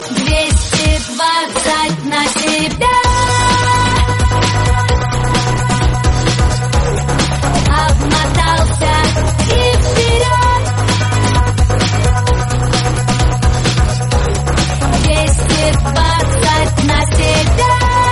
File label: nokia 220 ringtone